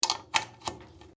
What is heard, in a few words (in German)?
bad stecker